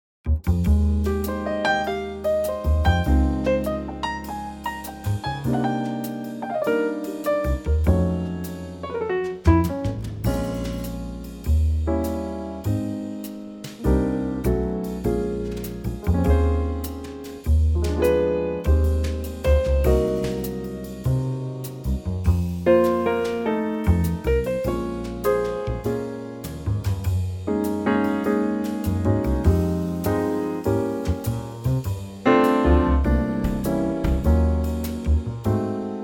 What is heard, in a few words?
Ab Trio; key - Ab - vocal range - Ab to Bb; Wonderful Trio arrangement of this classic standard, very lightly swinging, with some subtle chord chang